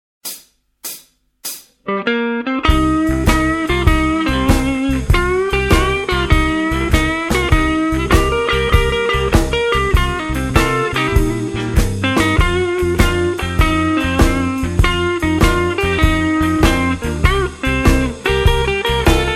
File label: blues jazz